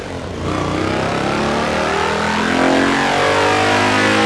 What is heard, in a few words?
second, vehicles; bmw isetta